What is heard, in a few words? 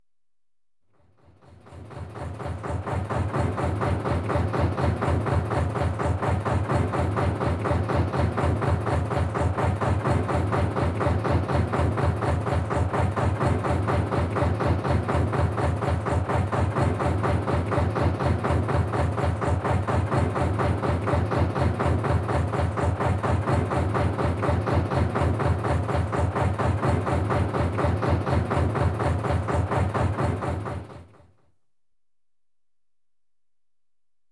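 Canal Narrow-Boat, Engine Tick-Over; Ships And Boats; BBC Sound Effects Library; Ships And Boats 2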